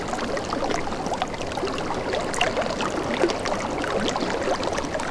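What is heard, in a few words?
river light loop; ambient soundscapes; dota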